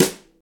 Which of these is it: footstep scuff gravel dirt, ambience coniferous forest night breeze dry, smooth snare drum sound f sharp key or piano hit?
smooth snare drum sound f sharp key